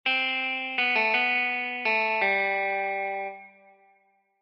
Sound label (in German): Gitarrensolo